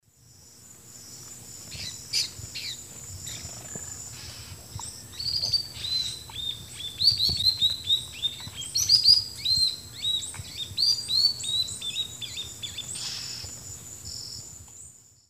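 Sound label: Notharchus tectus, calls and song playback; S. America; Eastern Lowlands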